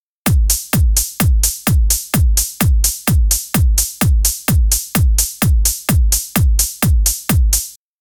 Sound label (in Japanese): ドン、チッ